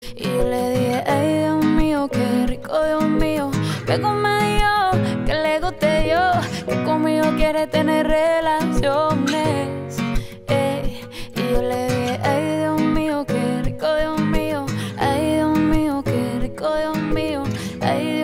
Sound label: Acoustic